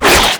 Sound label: Proto Infested Runner Arm Attack, Enemies; Warframe; Abilities